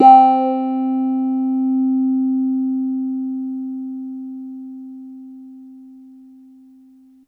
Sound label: Piano Clav c3 med